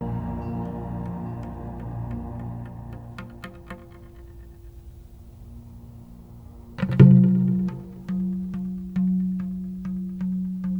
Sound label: Hillside Drums; bandit queen 1994